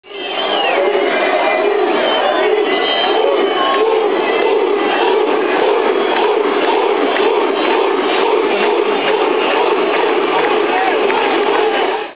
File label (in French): Rouge